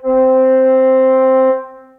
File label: Alto Flute Solo Legato, Orchestral Woodwinds; Ableton